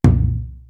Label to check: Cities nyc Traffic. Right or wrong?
Wrong